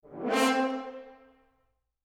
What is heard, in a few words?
rip